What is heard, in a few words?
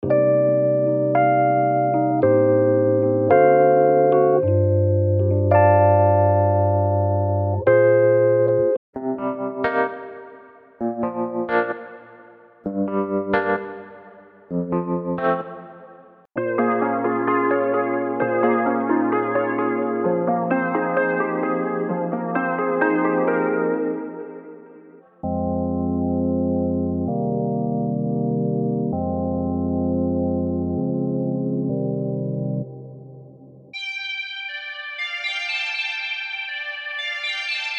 RB; MIDI Wizard 2.0